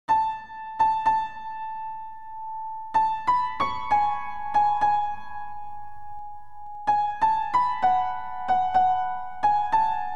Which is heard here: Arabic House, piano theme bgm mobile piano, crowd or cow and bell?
piano theme bgm mobile piano